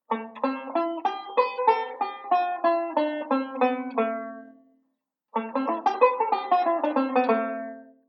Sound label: Modal Sequence, banjo